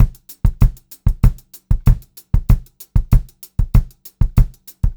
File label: POP VARI, Pop-Rock Drumloops; USB Soundscan vol.37; AKAI; 96 POP